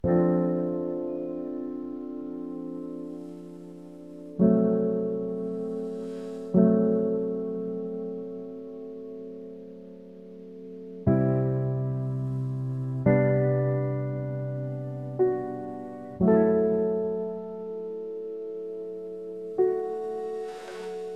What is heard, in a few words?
waves against heavy iron, piano sleep open sea